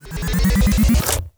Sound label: Auto shot; Fly For Insanity